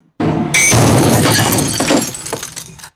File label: heavy object crashing onto car with shattering glass; destruction